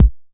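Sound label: 909 kick short, EMU Proteus-2000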